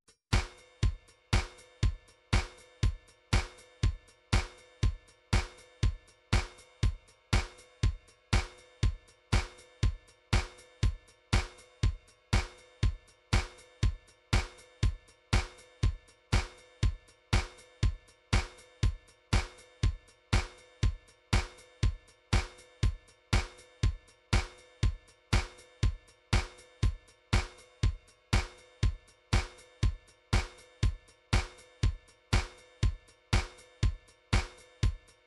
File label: drums, midi drums